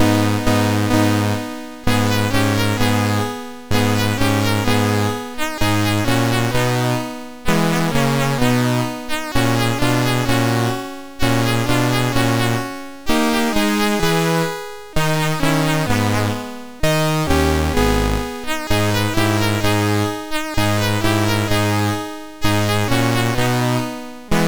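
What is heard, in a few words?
Song Chorus of Girls, MOS6581; Edith Kate; Chorus of Grils; MOS6581R3; Pirates of Penzance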